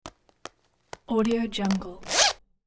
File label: broken or jammed zipper; hollywoodedge